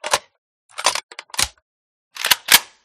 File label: reload; groza; resurviv